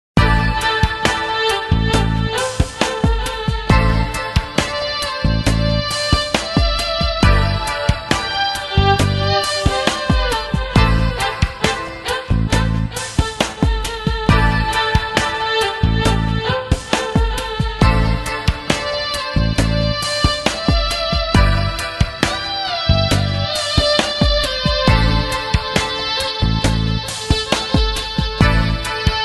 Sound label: erhu asia